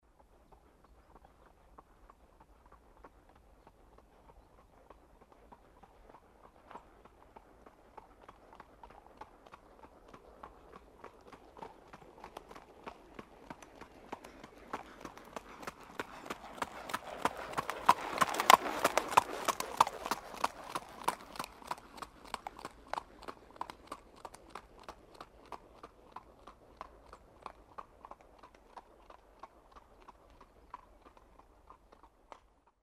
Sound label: horse trotting